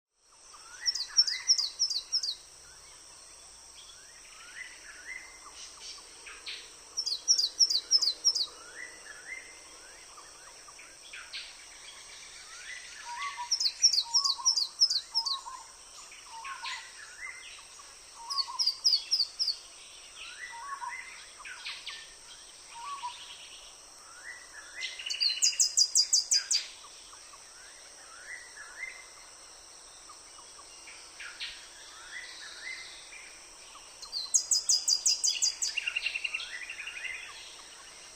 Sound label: Mistletoebird